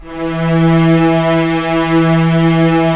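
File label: STR String Section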